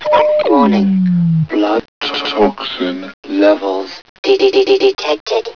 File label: zombie idle; sven; zombie hev